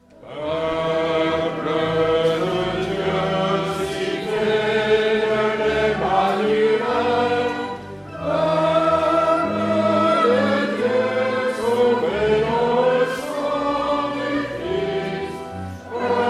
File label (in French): chant entree